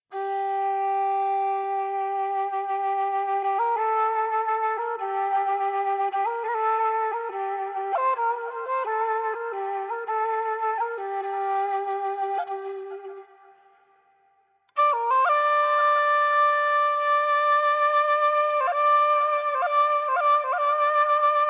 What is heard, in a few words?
Ney Solo